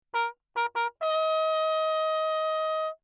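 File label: trumpet, without mute, signal; Bach; ohne; Perinétventiltrompete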